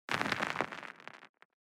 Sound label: stone Pebbles